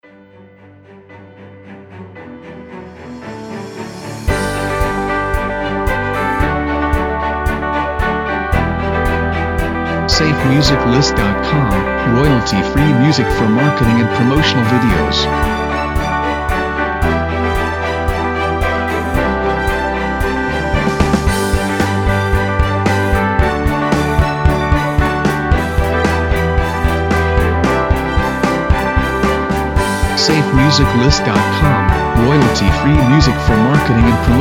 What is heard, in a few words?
Inspiring Achievement; SafeMusicList